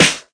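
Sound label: classic hip hop Snare; Classic Hip Hop Mega Kit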